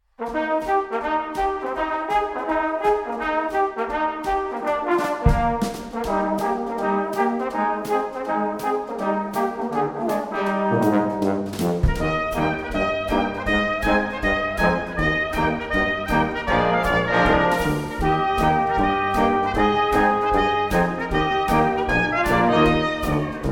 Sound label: brass